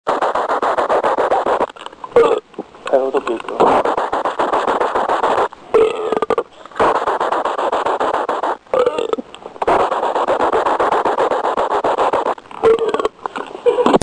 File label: burp